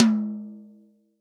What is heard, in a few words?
REAL DRUMS POP KIT; TOM; Best Service ProSamples vol.43 - Real Drum Kits